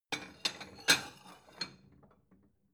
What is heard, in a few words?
plate small shelve slide soft